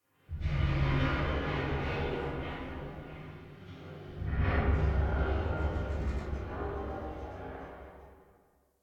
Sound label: Metallic Rumble; Warframe; Ambience; Entrati